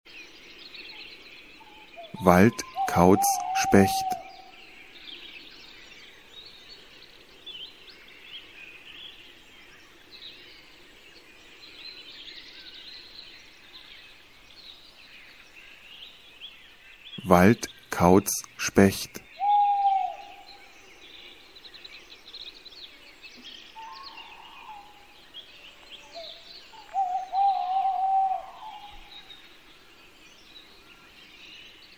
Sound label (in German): Wald Kautz Specht; Natur